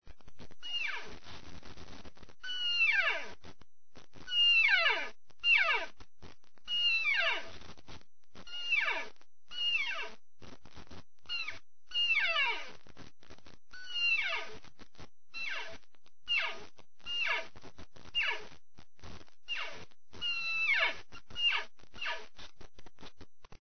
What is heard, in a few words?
cow, elk; elk 2001